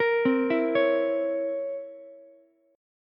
C 7th Flat 9th C, Ukulele; C7b9 arpeggiated; JGuitar

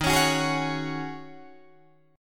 Eb Minor 11th Eb down Guitar Drop D; Ebm11 strummed

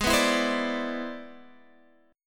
C Augmented Major 9th G sharp, chord

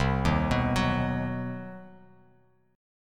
C Minor Major 7th Flat 5th chord, Bass Standard; arpeggiated; JGuitar

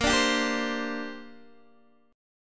Bb 6th Add 9th Bb Guitar DADGAD

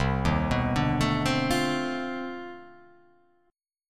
C Minor Major 13th, chord; down; chord shape

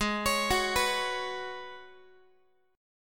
G sharp Minor 11th G sharp Guitar, chord; G#m11 arpeggiated

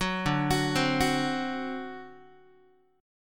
D 7th, down, Guitar; D7 arpeggiated; JGuitar

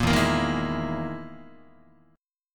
E Major 7th Flat 5th down Guitar; EM7b5 strummed; 1,0,1,1,0,3; JGuitar

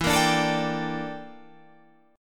G Minor 6th Add 9th E

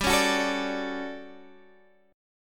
D Major 7th, G

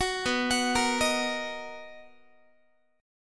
B Major 7th B down Guitar; arpeggiated

